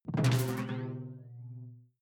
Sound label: sci-fi alien splash detuned soft; swooshes-swishes